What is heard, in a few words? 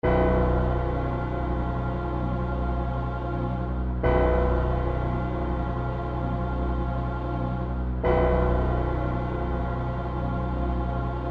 Space Death, music